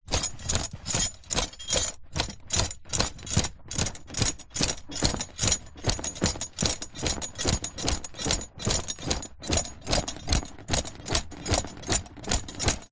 horse riding saddle movements leather metal jingles running; animals